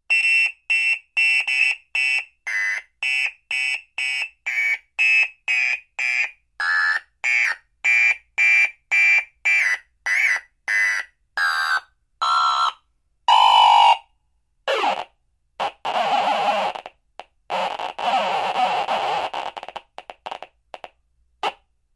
Alarm Car Type 1; Modulating Fast Beeps And Chirps Speed Up And Slow Down, Alarm Slows Down And Starts Again 3x